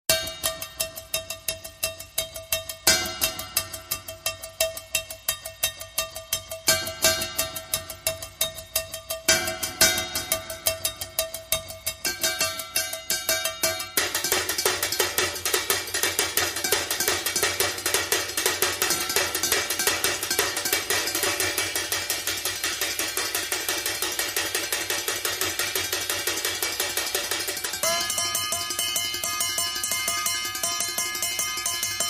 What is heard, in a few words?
High Drums